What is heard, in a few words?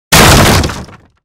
door kick down; human